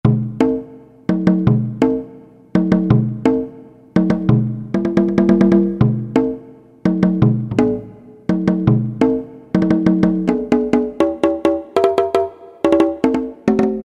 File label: CONGA; Latin And F.X. Percussions; Roland SN-U110-02